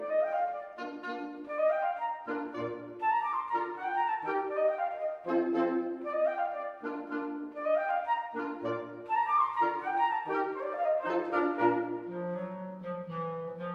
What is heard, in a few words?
Quintet; in Bb Major, op.100, no.6, Mvt 2